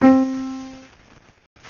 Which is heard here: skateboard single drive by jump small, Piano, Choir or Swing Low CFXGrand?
Piano